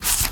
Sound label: Open; Kilta